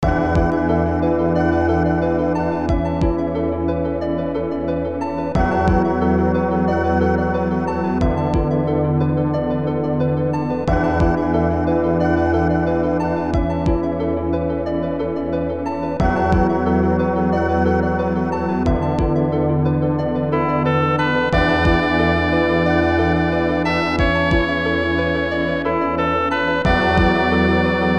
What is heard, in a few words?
Dungeon; Shinseiki Odysselya 2 SNES